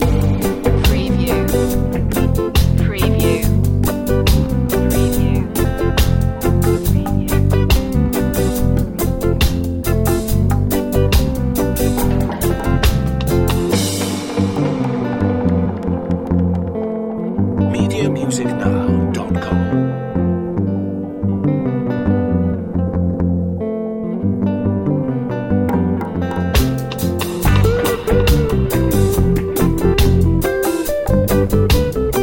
summer reggae jam loop

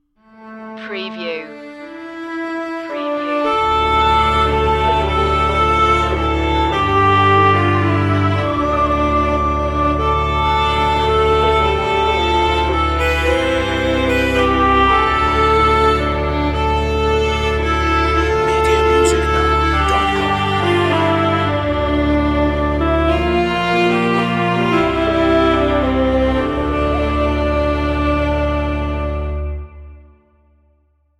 medieval tale no harp

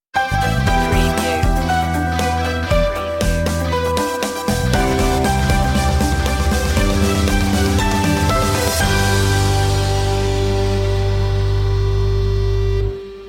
flying high stinger

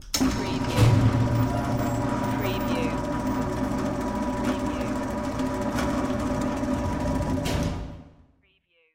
Warehouse Door Close; Sound Effects Bible

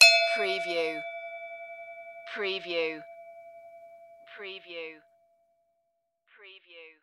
Bell Ring Single

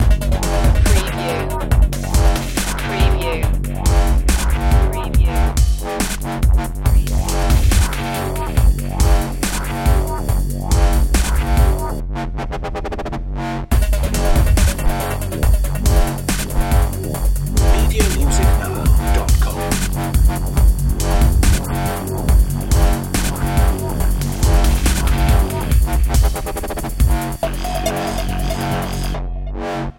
inevitable drum

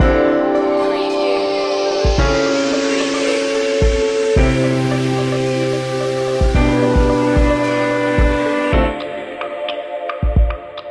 lounge water loop

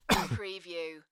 Male Cough; beanstalk audio

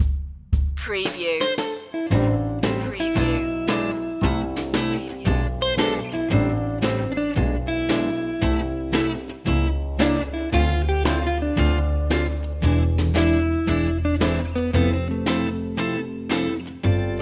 hiphop

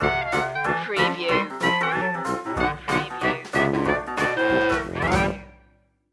wildcard sally stinger

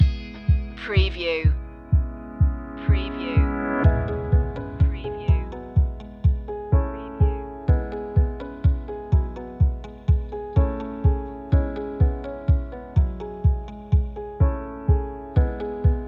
a sunny outlook no lead no vocals

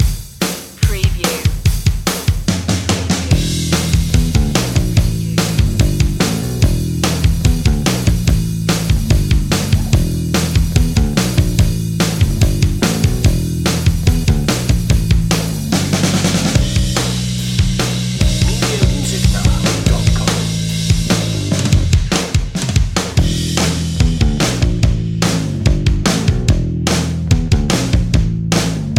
rushing drums bass

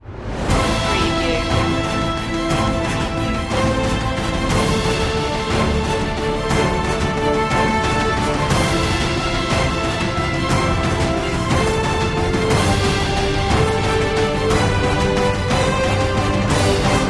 no choir

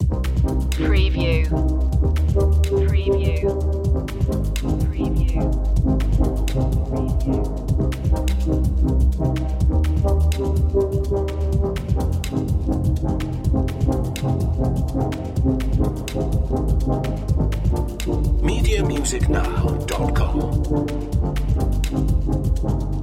erased loop

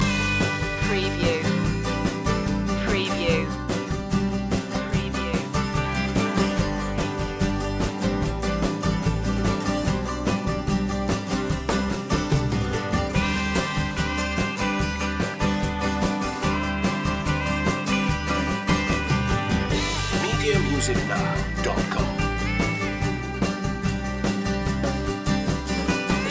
mandolin road loop

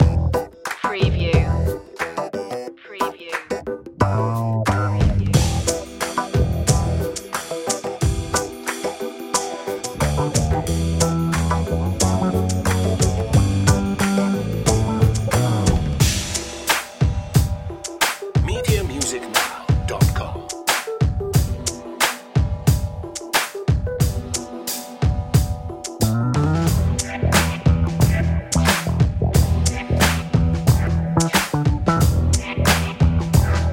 crazy funky bass

